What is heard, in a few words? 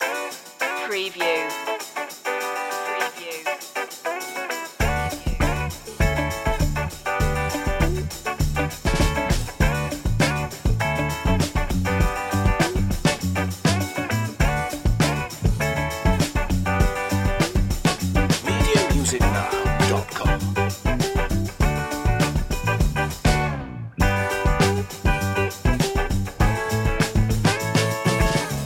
no brass